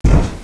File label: pipe bomb ground